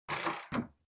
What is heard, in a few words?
Close Door